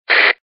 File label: radio ON